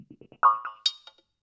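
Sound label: PERCUSSION ELECTRO 140, Techno Drum; Techno2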